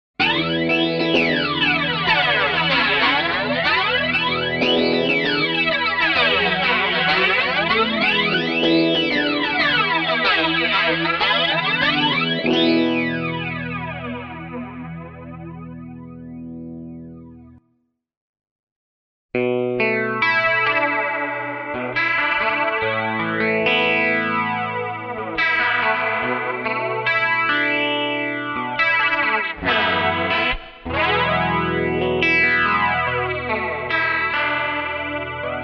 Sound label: Flanger BF-3